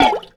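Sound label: WASHEE BEL, percussion metallic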